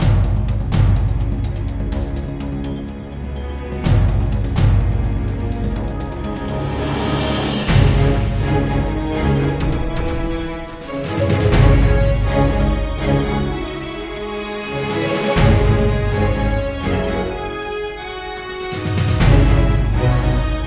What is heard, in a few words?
roomselect, music